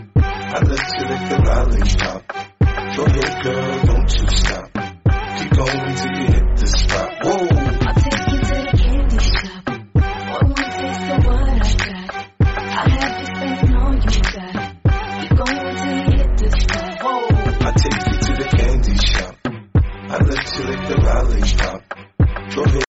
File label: english